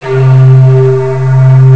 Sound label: bamboo; jazz jackrabbit 2; M8